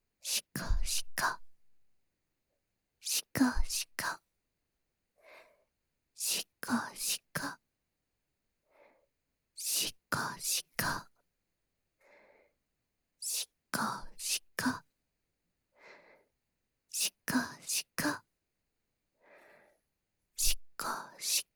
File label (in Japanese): 手コキ（しこしこ・右）（SEなし）手交